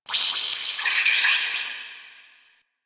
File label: interior vain squeeze; Ambience; Misc Indoor; DE; Warframe; Infested Eris